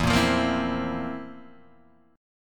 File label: F Major 7th Suspended 4th E